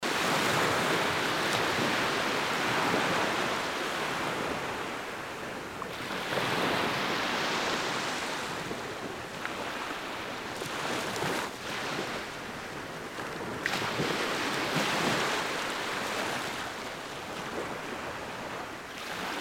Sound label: Fiji Beach Waves